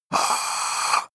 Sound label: male breathe eerie